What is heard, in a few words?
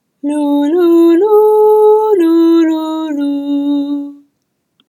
Echo Pattern